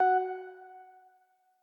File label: Quasar Move F